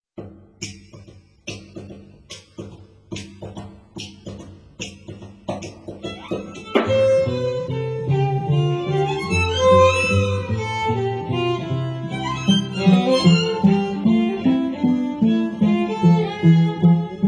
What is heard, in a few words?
Jazz